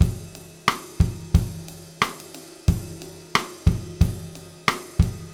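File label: 90 bpm, beat loops; Zero-G; Monster Beats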